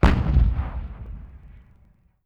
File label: distant gun; WAR